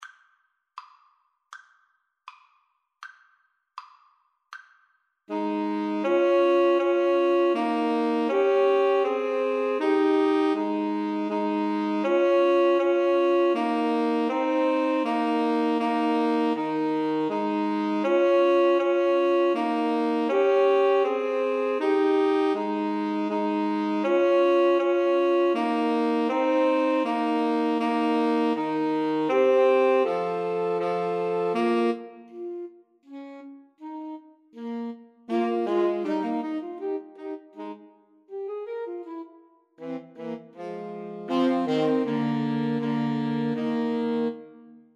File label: Bach Gavotte Cello Suite 6 SAX